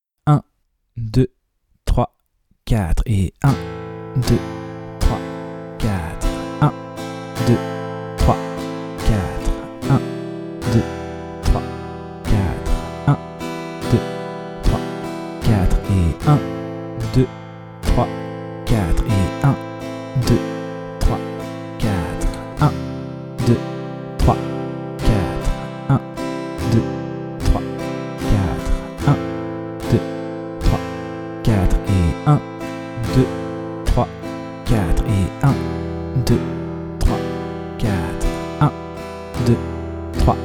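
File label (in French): lent La rythmique rapide avec la première grille, suites d'accords; De 0 à vos premières suites d'accords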